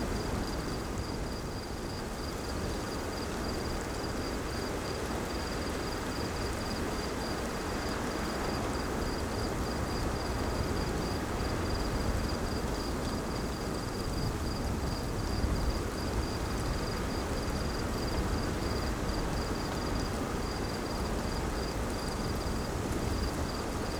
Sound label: ambience forest night windy drizzle; Rust